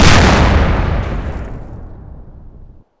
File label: explode